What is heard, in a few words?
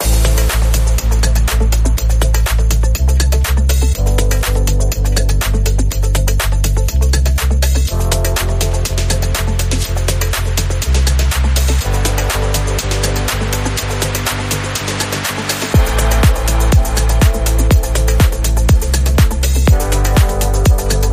Trance Tranquility, Rhythm